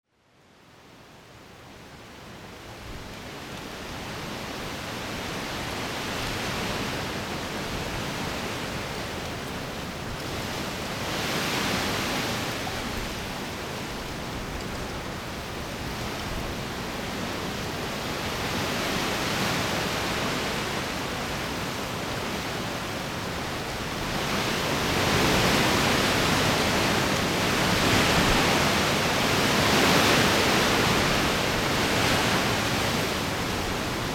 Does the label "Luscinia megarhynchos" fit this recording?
No